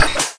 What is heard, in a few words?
laser beam end; immersive sounds; Factorio Paranoidal mod; cyclic